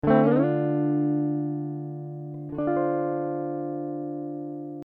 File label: Dm 100 GTR Riffs